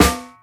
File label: Snares, Real